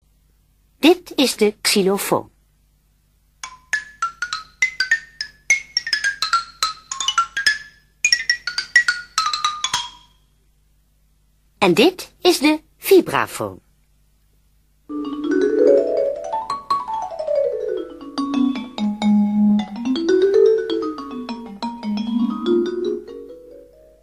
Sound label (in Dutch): Xylofoon vibrafoon